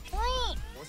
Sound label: hoi